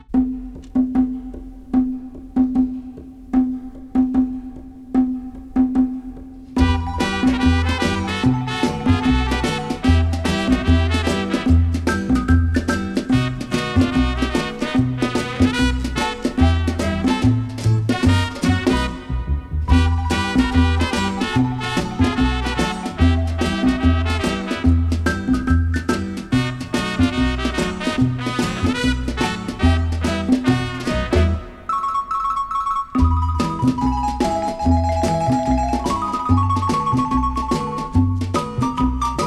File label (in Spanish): Trompetas